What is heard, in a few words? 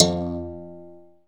BERIMBASS, BERIMBAUS; Heart of Africa